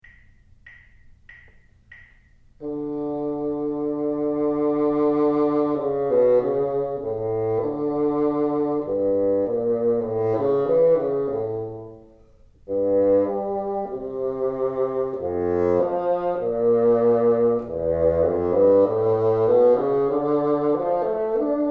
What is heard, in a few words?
teacher, bassoon